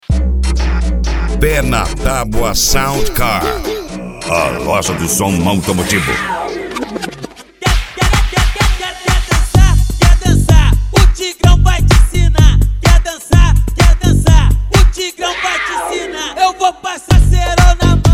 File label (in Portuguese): sound car carnaval